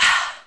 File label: BREATH, BeatBox Female; drums full kits; unusual kits and fx